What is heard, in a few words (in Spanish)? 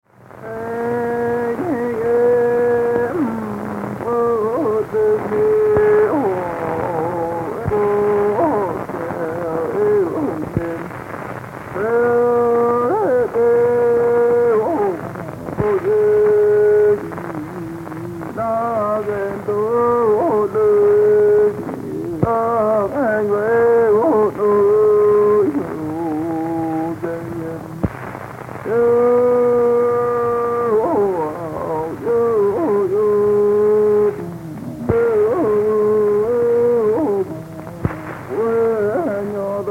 Canto; El Naranjo, Lonquimay, Chile; Música Mapuche